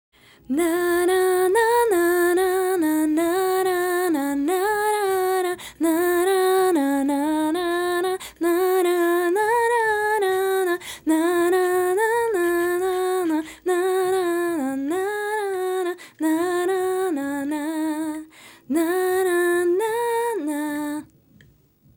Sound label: acapella